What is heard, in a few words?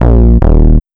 error